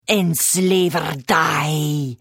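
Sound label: Attack, Khajiit female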